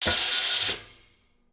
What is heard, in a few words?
air release; spaceship